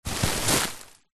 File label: plastic bag bin liner full of soft clothes or pillows push pressure apply; zapsplat; foley